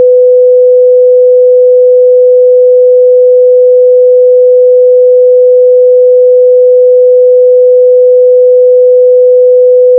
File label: sine 1k; audio CD